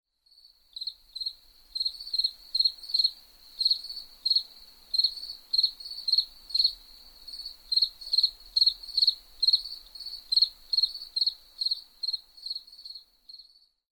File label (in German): Grillen, Frankreich Sueden; vogelwelt suden frankreichs